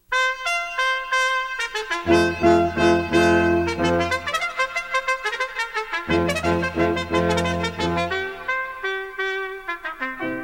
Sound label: Trumpet Battle